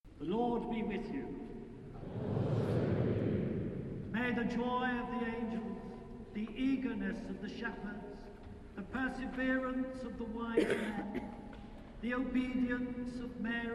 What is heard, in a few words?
Cathedral Organ Choir Christmas eve 3d Binaural; Lincoln Cathedral